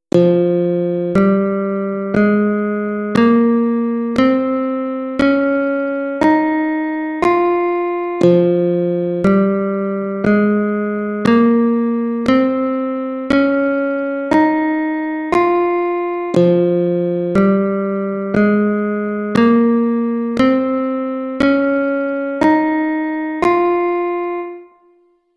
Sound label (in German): F Tonleitern natuerliches Moll aeolisch